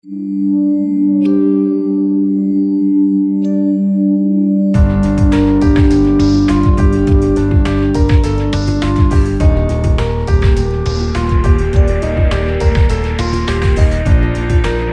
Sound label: BAR KEYS PIANO